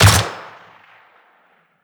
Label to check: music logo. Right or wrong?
Wrong